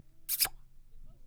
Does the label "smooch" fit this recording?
Yes